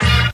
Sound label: buzzsaw